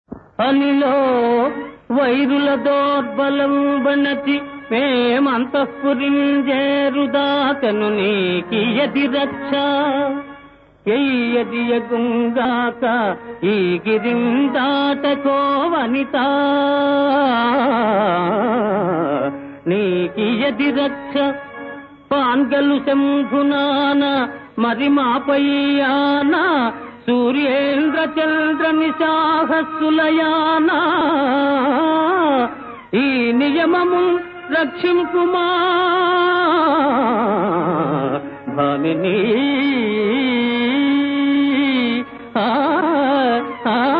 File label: Padyam; Balanagamma(1959); Anilo